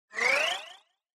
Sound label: Scene Change Short